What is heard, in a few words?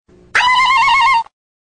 scream; xena